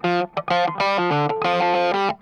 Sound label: I INSIST, Funk Guitar; 90 s Sample CDs; Zero G